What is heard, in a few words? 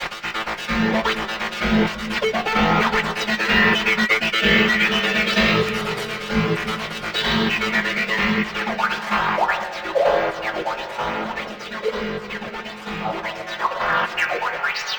Spastic Arpeggiator, EDM; Sonic Foundry (Sony Creative Software); Afterhours EDM